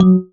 marimba raincoat; ゆめ2っき

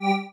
marimba spacesuit; ゆめ2っき

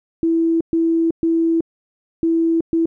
Hardwear On

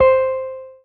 marimba guitar; ゆめ2っき

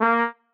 marimba trombone; ゆめ2っき

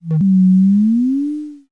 wind bump

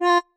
marimba; ゆめ2っき

